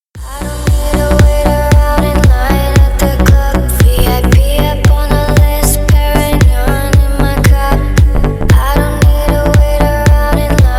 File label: deep house